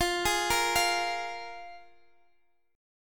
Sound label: F Minor Double Flat 5th, F, Guitar, Open D; down; chord shape